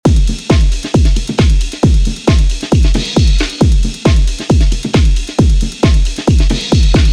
Full Beat

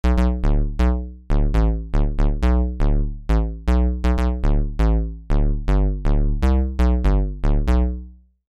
Retro Synth analog

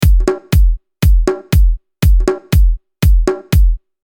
bongo

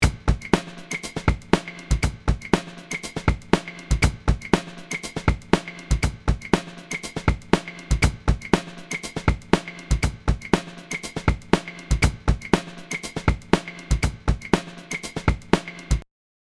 Drums LA2a Real

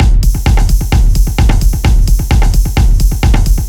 Big Beat Techno

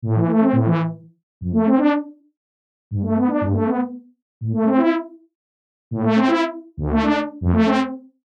Harmonizer Effect Collapsed to Mono

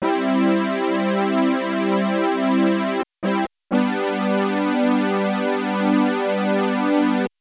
JX3P Soft Synth Brass

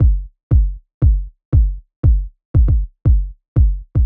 Kick

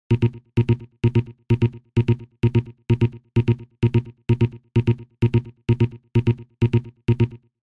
Bass Seq With FX; Dexter

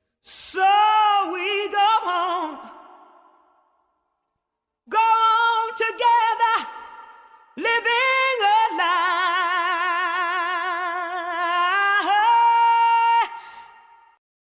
Vocal Dm

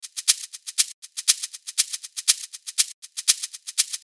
shaker

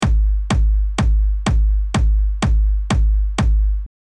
Post Compression

